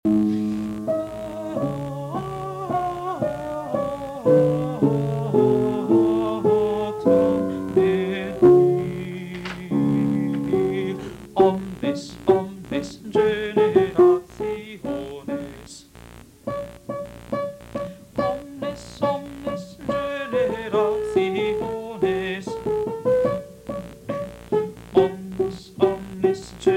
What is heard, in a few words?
Magnificat; Bach